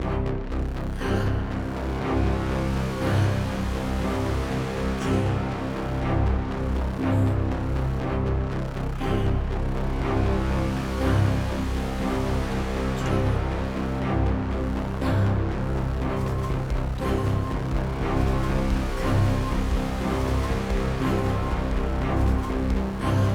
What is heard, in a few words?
Loop 120bpm; Planet of Lana; Orb Boss v030523 A